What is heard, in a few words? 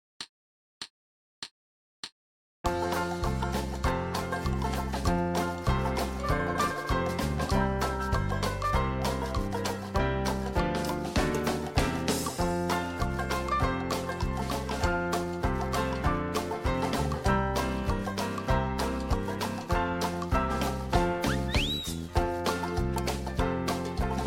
trombone rag, 98 bpm